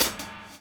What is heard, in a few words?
DOWN, DOWN KIT 78